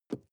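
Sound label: sports car interior handbrake release short; verkehr-transport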